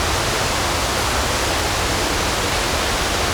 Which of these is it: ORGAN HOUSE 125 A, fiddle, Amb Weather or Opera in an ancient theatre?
Amb Weather